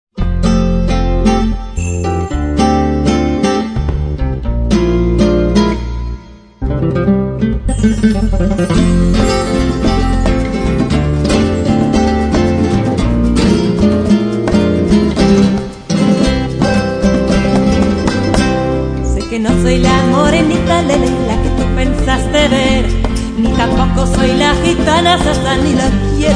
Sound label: flamenca